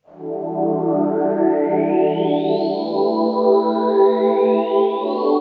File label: Synt